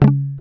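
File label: GUI C#, acoustic guitar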